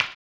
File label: HAMBONE, Vocal Blues Soul; Spectrasonics; Planet